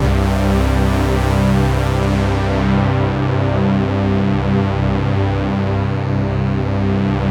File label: LEADS; Masterbits; Vintage Voltage